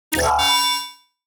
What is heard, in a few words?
Window Close Short, ExoInterface; G4F SFX